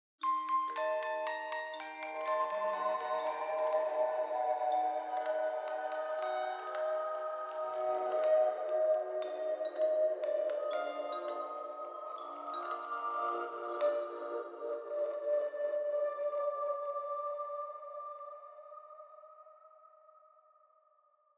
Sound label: Music Box; Get Even